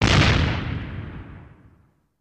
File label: Big Distant Dynamit, explosion